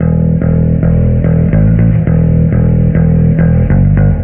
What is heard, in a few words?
bass, Loops Phonky